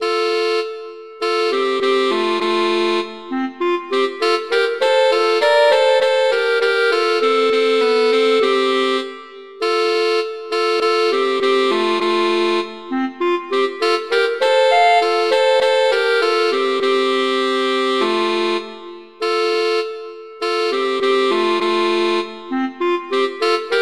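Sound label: Duet, horn; Mozart; Duets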